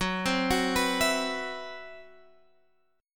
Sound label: C Major 7th Flat 5th Gb, chord